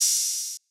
open hat

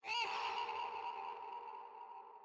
FX; BUSY BODY

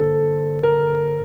Loop

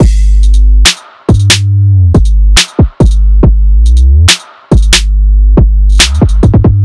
DrumLoop 140 bpm; Kryptic Samples

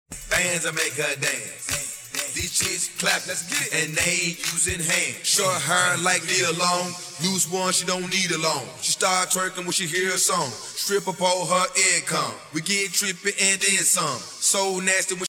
Acapella

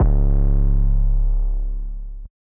slippery 808

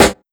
Snare

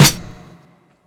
Closed Hats

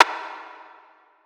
Reverb Rimshot; Percs

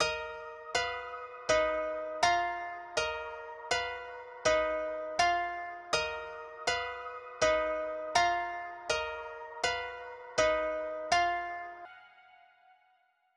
162BPM

